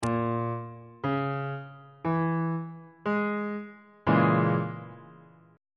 c sharp minor 6th 3rd inversion on bass clef

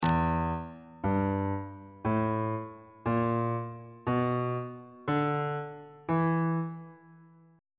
e blues scale on bass clef

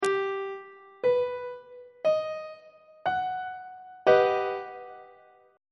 g augmented major 7th chord; on treble clef

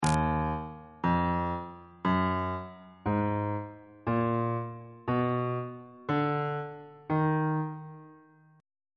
e flat harmonic minor key signature

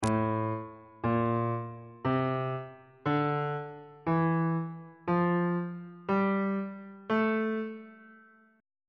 a phrygian mode on bass clef

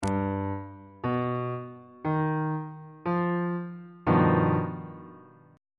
f double sharp augmented 7th chord

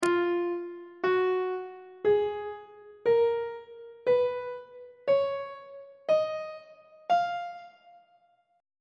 f locrian mode; on treble clef

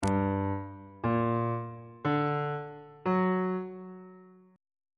g minor major 7th chord intervals on bass clef

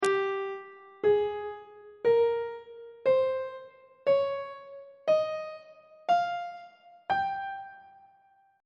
g locrian mode note positions on treble clef

